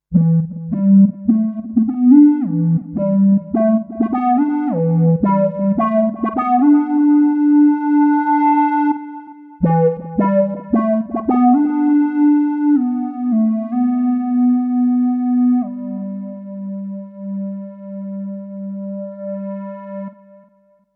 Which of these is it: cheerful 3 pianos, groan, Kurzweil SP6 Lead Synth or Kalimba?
Kurzweil SP6 Lead Synth